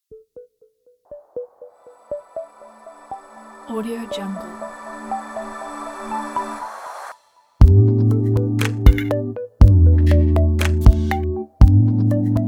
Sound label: technology background soft kit